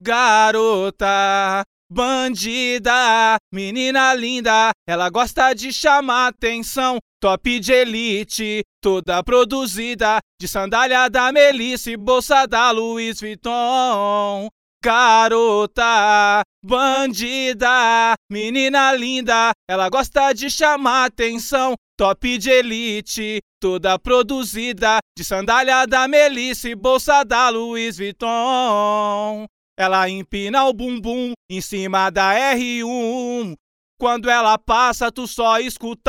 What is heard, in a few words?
acapella